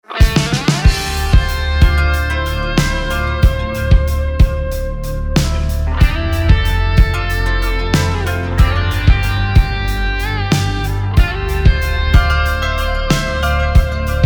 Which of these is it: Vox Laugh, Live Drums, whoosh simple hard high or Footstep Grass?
Live Drums